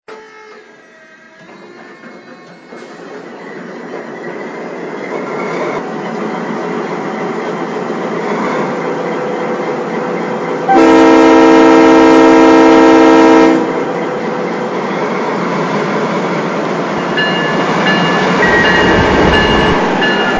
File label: Dash-9